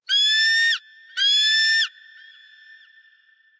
ambience good bird; smite; conquest

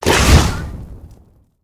Suction; Smite; Susano

